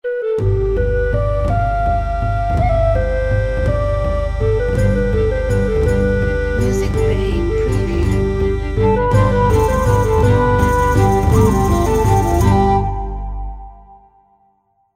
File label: Celtic Logo